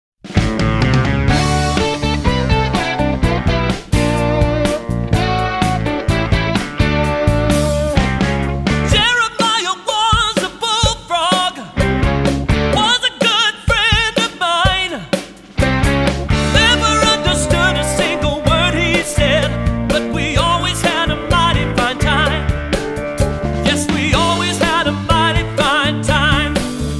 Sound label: recorder